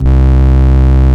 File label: VOX C1, Vintage Keyboards; Big Fish Audio - First Call Vintage Keyboards